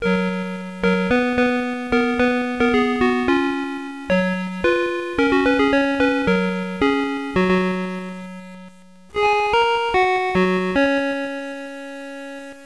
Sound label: tune